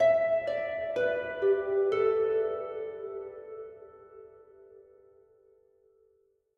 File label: Harp High; gamerip; that dragon cancer